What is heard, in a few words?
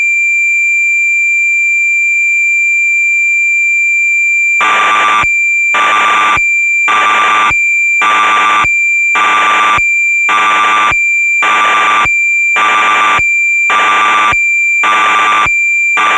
Yamaha DX9 factory presets master, Cassette Interface; Synthesizer Factory Patches